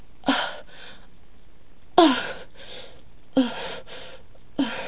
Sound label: short bursts of pleasure, Silly Lo-Fi Orgasms